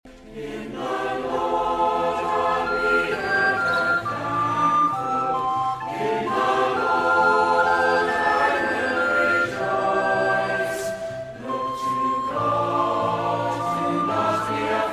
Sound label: choir